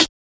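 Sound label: Snare, 100bpm, A minor; HappySloMo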